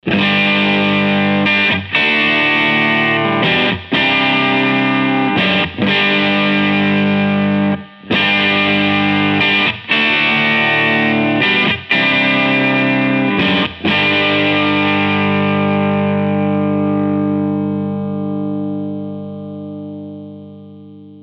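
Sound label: prs bridge clean rhythm; prs22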